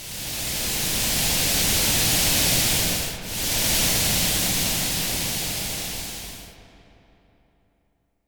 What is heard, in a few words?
Breath